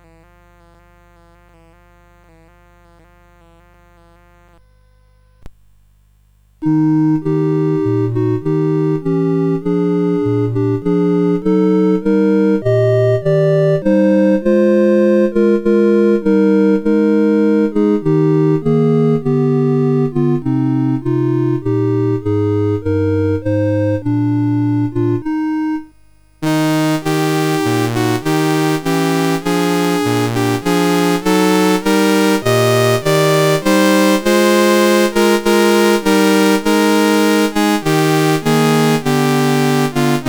I Heard the Bells on Chistmas CSG8580R5

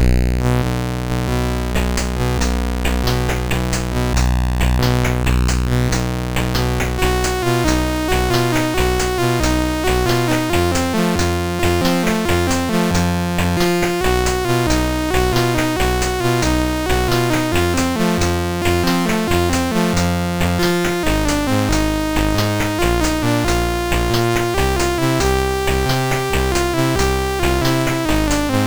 sid MOS6581R3; Horror City

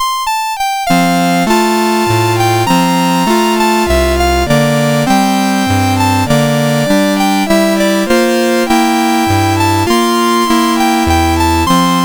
Piano Concerto, MOS6581R2; No1 Op23

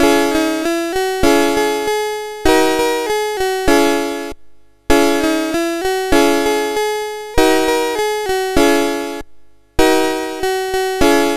sid MOS6581R4

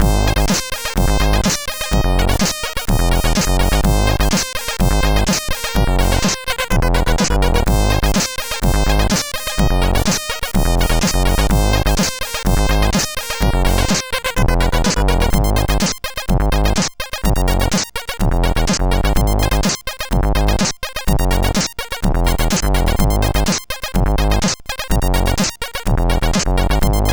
sid CSG8580R5

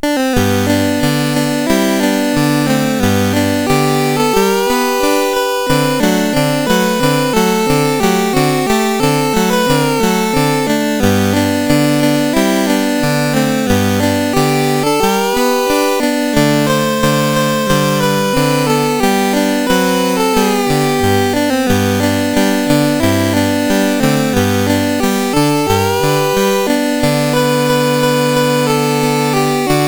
Yellow Rose of Texas, CSG8580R5

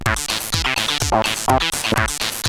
Intro, sid CSG8580R5; Rebels

